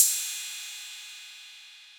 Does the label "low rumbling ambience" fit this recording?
No